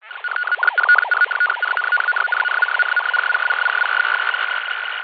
science fiction radio static interference crackling scrambled code; zapsplat